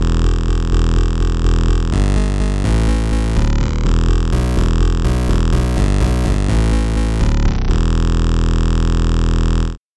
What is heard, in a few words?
fifth sawtooth wave bass